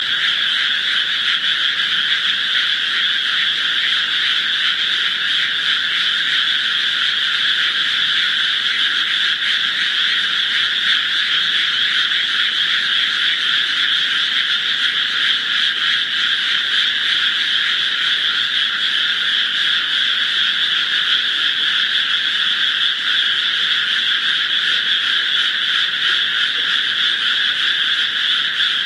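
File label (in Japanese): amagaeru, Japanese tree frog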